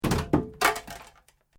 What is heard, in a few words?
PLASTIC MOVEMENT MINOR; Material components for constructing more complex sounds